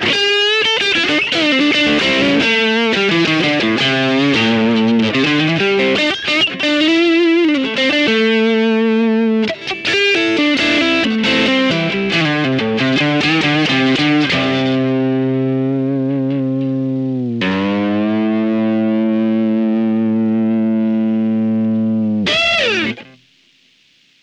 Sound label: Vox AmPlug Treblebooster Low Gain